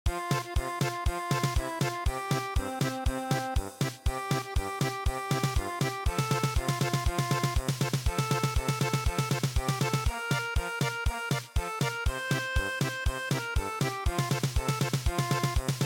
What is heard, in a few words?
German Polka, traditional